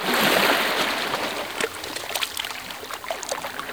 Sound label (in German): meer